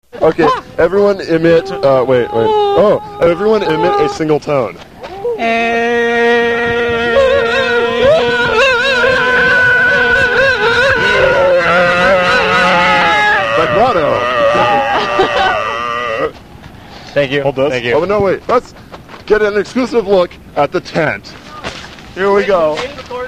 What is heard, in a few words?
Single Tone; Camping Trip